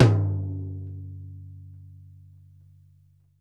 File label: RACK DRY, Drumworks; Sampleheads; New York City Drumworks VOL-1